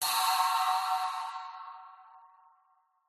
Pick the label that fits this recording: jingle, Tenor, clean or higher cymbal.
higher cymbal